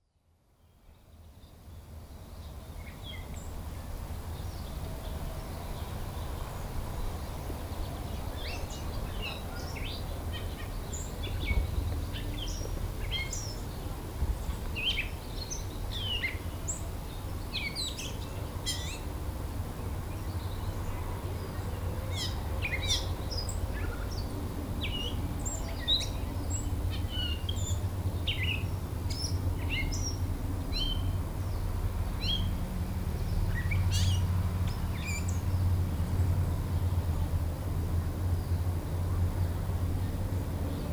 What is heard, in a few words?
birds, backyard, wind in trees, road